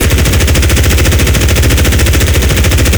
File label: NR23; Weapons; DCS